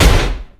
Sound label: power; zs power